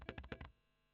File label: E. GTR Gefell OD